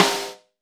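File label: Brush Snare; Snares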